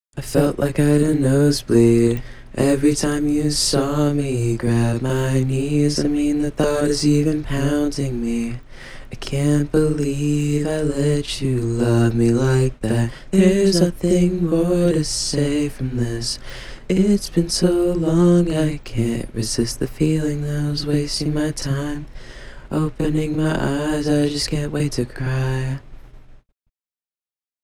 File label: acapella 67bpm D sharp maj; nosebleed